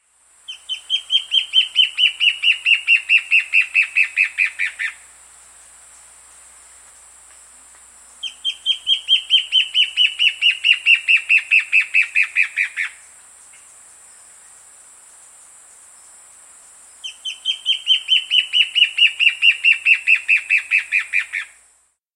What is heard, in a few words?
Ash-Breasted Antbird; S. America